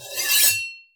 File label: sword out, weapon